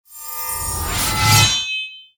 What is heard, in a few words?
Animated Shield Play